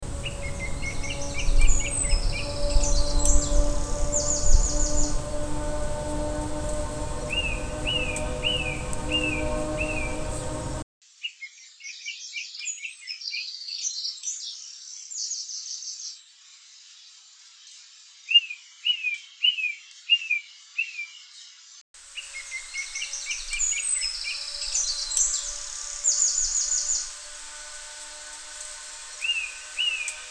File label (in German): Voegelzwitschern